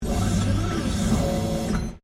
Door Automatic Servo Driven Close Short Stereo, Motor driven automatic lifting door; Miscellaneous Doors